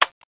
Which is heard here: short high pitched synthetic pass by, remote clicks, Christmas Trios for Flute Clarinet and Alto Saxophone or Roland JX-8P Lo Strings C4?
remote clicks